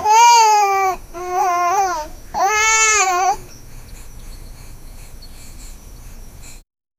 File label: soft moans of the baby, baby deer